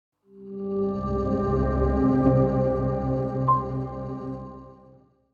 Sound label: Corporate soft logo intro